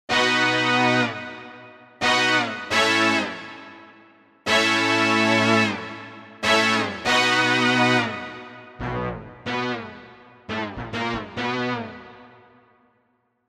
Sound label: Brass Down, Korg MSC-1S; Korg MSC-1S Variety